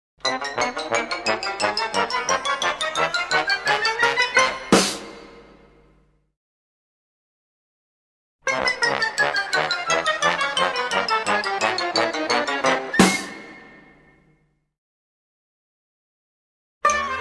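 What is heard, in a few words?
4 variants of a musical insert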